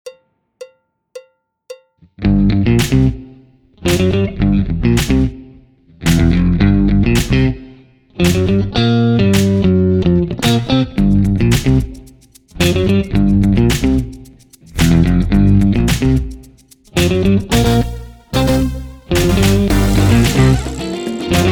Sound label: Funky drumless